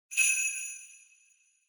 Sleigh Bells Dry Twist Hard

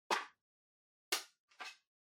Portable CD Far Open Take Out

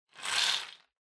Drawing Machine Garapon Noise

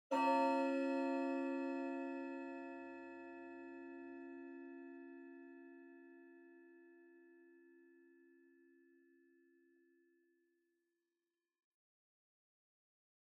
Pendulum Clock Chime Far Low Set

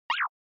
Motion Slide Fast High

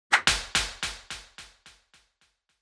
Hit Slap Delay; 打撃

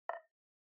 OS Sound (Speaker-Notification)

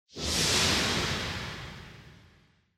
Scene Flashback Short

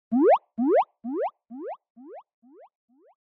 Anime Motion Delay

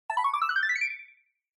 Scene Change Slow

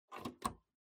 PC Hardware Earphones Plug In

